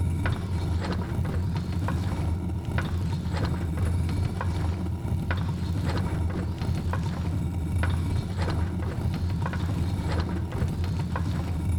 Disk Rotation; DiskPuzzle

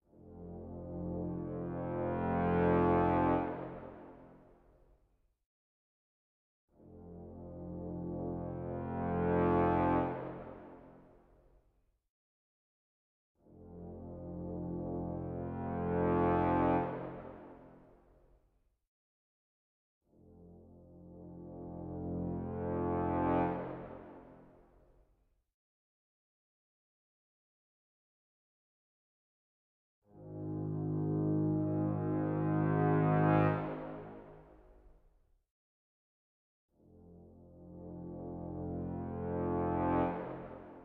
Combat Horns Stem; Necropolis

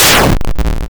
player death; bullet party

